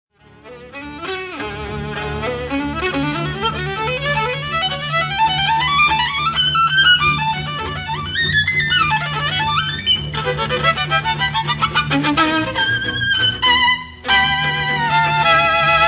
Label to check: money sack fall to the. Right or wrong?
Wrong